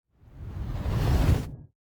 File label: damage hits explosions, Warships